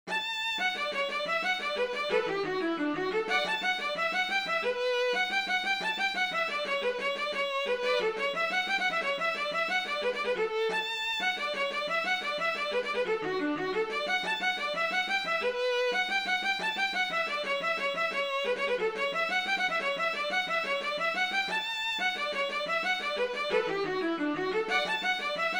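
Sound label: reel, melody 3rds 5ths performance speed; Ancestors Reel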